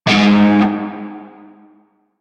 Shawzin Oct One G Sharp; Music; Warframe; Narmer Shawzin

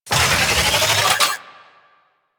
Excavator Drill Power Full; Ambience; Warframe; Infested Eris; Encounters

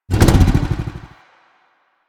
Engine Rev Stop, Motorcycle; Motorcycle Ninety Nine

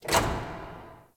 Worker Lever Pull; Warframe; Venus Open World; Venus Solaris United

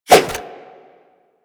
Nunchaku End Whoosh; Warframe; Tenno

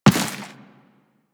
Run Dirt, Footsteps; Warframe; Teshin

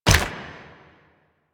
Proto Infested Runner Step Default

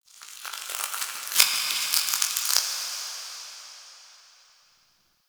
Champ Crypt Stone Crackle; Ambience; One Offs; Champion Crypt

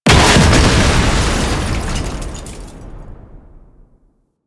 Rocket Launcher Explosion; Weapons; DE; Warframe; Tenno; Lasrian

